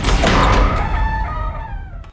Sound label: door open; DAoC